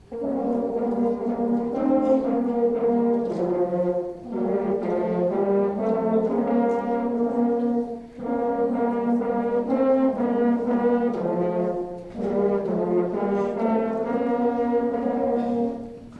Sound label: Horns